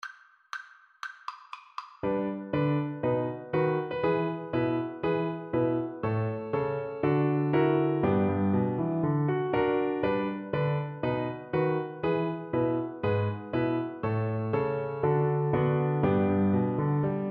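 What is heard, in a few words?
viola karaoke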